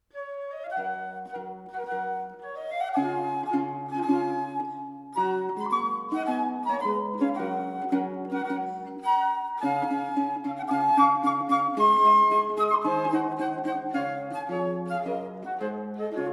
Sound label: Pieces for flute-clock